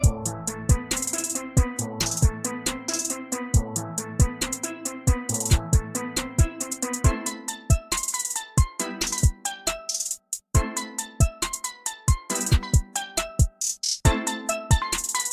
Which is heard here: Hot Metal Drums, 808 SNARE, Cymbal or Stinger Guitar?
Hot Metal Drums